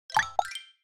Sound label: Last Turn Notification; Disney Spellstruck